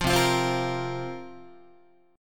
C 7th Suspended 4th, Guitar, chord

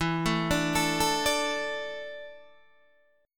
E 7th Suspended 4th, Guitar, DADGAD

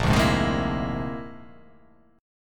Bb 9th Flat 5th Bb down, chord; strummed; JGuitar

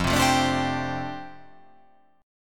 F 6th Add 9th F down, chord

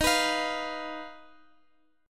Eb Suspended 2nd Sharp 5th, Guitar, Open G

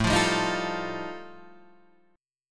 G 7th Suspended 2nd Sharp 5th A chord

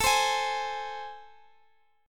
A Minor Major 7th A, Guitar, Standard; strummed; capo 3

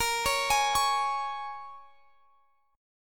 A sharp Minor 7th, A sharp, down, Guitar, Drop D

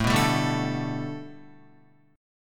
A 7th Suspended 2nd Suspended 4th Guitar; A7sus2sus4 strummed

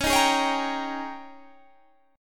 Ab Major 7th Suspended 2nd Suspended 4th Db

